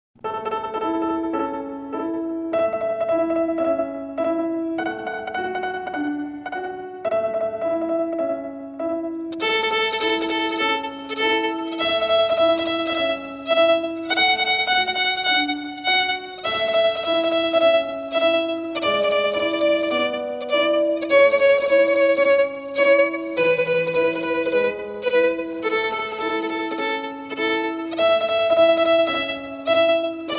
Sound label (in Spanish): Twinkle Ritmo A Muy Lento